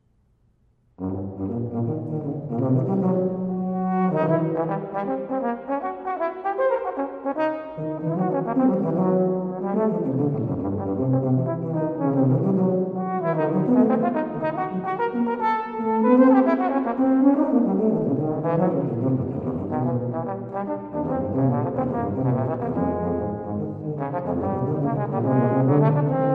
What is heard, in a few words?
Suite for Tuba and Horn - Gigue